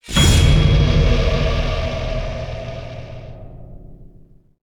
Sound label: sting Shock